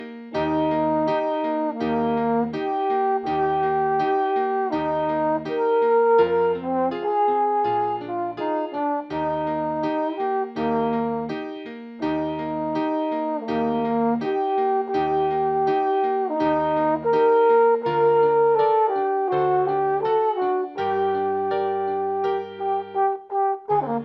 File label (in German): althorn